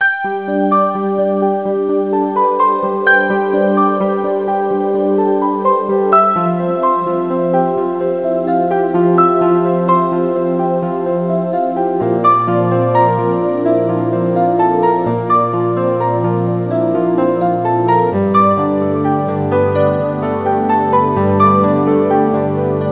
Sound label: mello; Tandy Sensation II